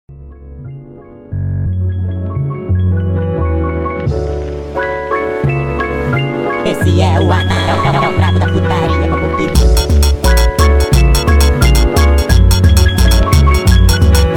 Brazilian Loop - Sped Up

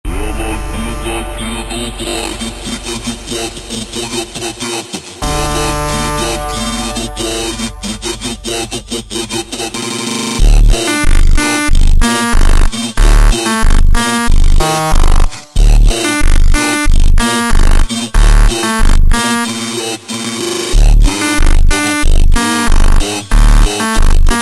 mega Slowed, funk

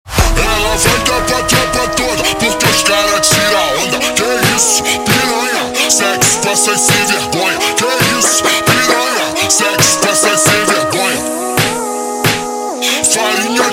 Brega Ultra Slowed; funk

